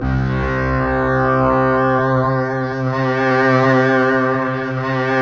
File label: SYN, Roland, digital; Sound & Vision - Gigapack I CD 2